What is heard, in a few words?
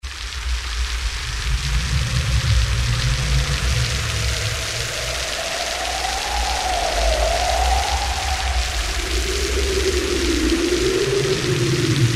Storm; Sanctuary